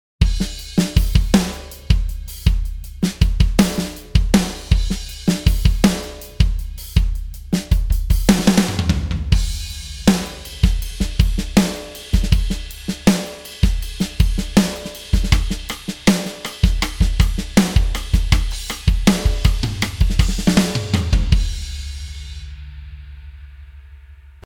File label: Rhythm Example